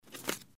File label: Tab Switch